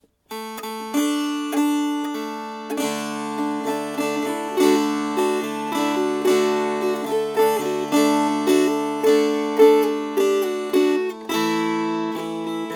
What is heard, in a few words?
Strummed